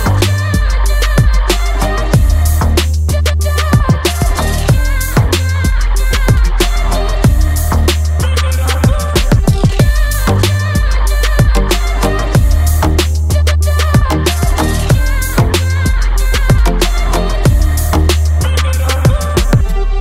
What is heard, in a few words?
Dubstep Ringtone; Samsung